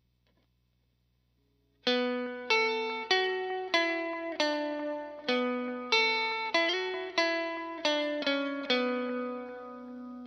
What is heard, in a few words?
Guitar Plucky 140bpm B min